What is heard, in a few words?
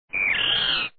Geep Low